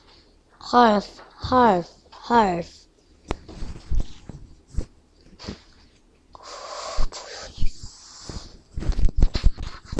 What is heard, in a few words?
harp